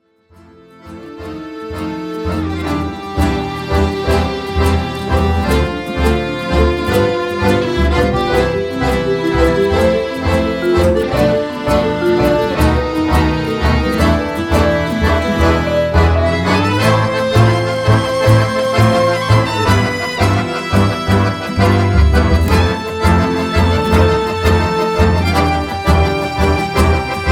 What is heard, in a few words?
tango